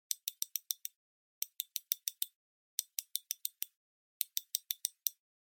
Pocket Watch Wind Up Reverse; 懐中時計; 巻き上げる 逆

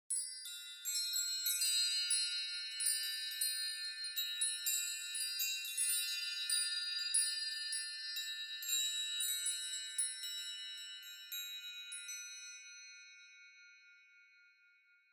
Cluster Chimes (Gentle Long)

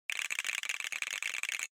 Motion Tremble Short

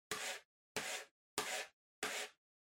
PC Mouse Move Far Hard Pad Hit

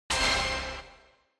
Bingo Machine Digital Start Dry

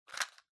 Match Shake

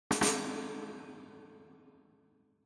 Tambourine Reverb

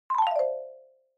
Xylophone, Fast Short Down

